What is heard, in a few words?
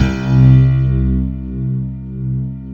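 grand piano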